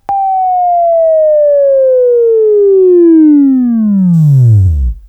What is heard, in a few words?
Buzz